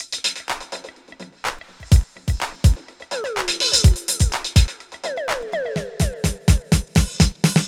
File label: disco drum loop; DRUMLOOPS; Best Service ProSamples vol.48 - Disco Fever; AKAI